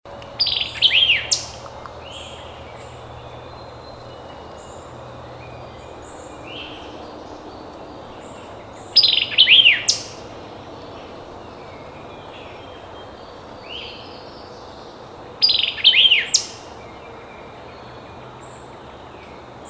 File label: white-eyed vireo song example; mike nelson